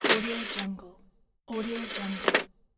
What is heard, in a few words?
the cd player eject